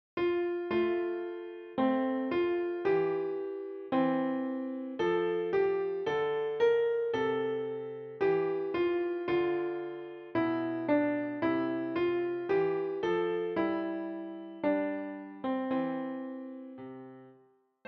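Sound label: piano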